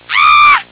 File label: scream short; Motorola MPX200